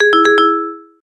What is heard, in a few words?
Notifier Eager, BlackBerry; BlackBerry 9000-Bold